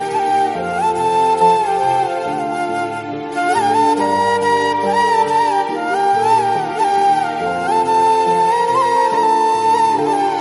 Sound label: Malaysia Song Bgm Theme